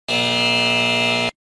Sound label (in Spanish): Falla Error